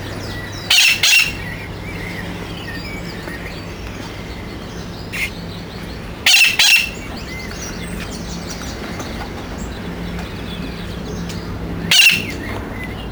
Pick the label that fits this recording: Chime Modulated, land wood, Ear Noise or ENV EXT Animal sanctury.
ENV EXT Animal sanctury